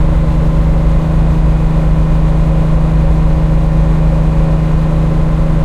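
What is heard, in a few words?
Valentine engine interior rpm load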